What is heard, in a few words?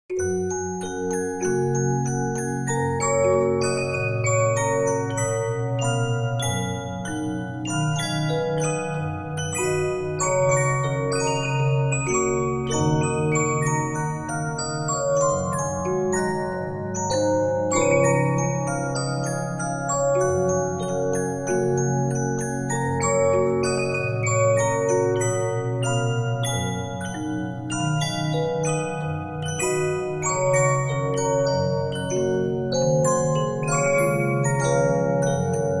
Regina, 15inch disc musicbox